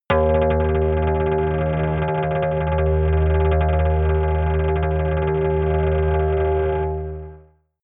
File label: synth bass rattle tremble, drones